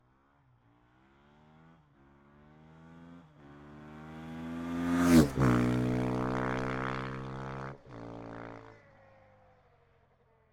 husaberg 500cc exterior startup away passbys approach stop shutdown; ortf; Sonniss GDC